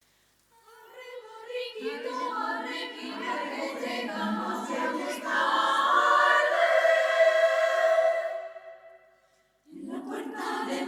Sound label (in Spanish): Arre borriquito, Coro; villancicos